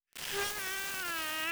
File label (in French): bebe heureux